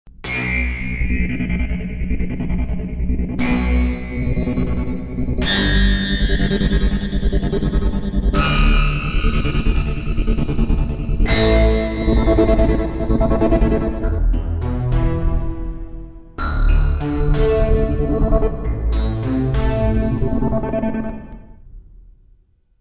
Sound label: SY square